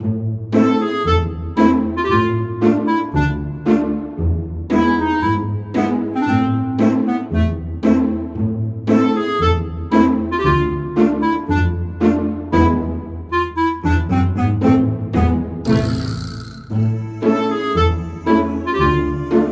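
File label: background music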